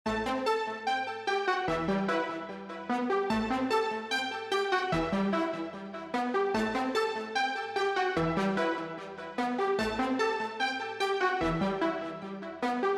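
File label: A minor 148 BPM Brass Pluck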